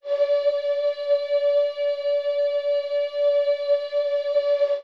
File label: flute hollow and low; Notes; Winds